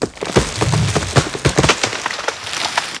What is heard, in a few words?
treefall creak; World of Tanks Blitz